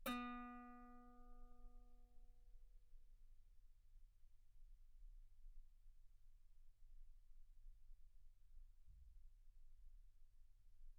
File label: Guitar B3; ff; sul B